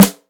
original acoustic snare g key, snare sample tuned to the G note